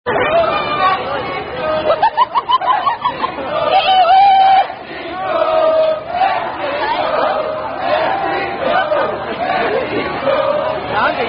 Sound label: Chant